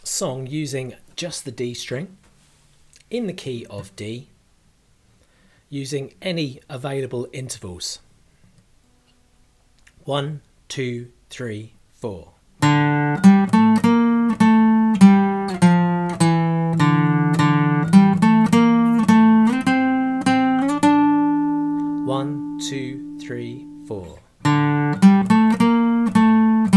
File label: Song In Key Of D; working out songs by ear; ear study